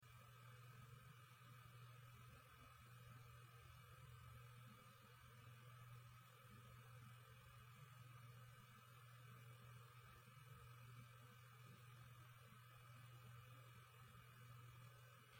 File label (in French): samsung spinpoint f1 640